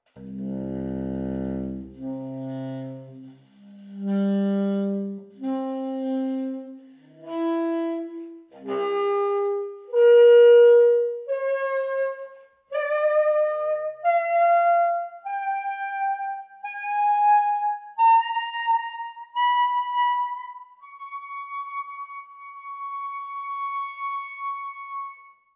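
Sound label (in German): bar, Flageoletts, Obertonreihe vom tiefsten Ton b (a bei Bariton) nach oben gespielt; Obertonspiel mit wechselnden Grundtönen. Bariton; saxophon; spieltechniken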